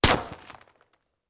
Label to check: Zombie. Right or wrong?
Wrong